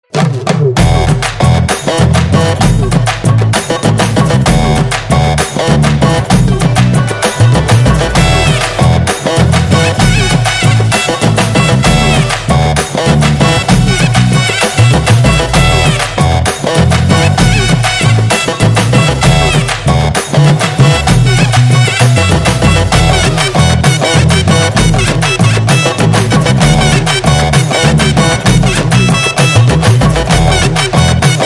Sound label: Folk Instrumental Ringtone